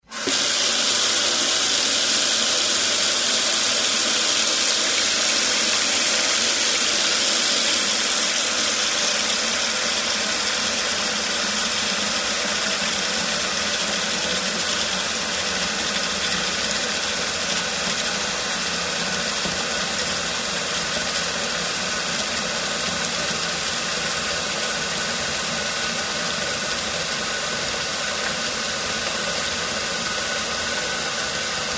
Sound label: Water flows into a metal sink